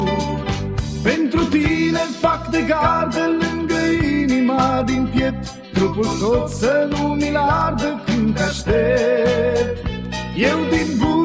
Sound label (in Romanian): Rock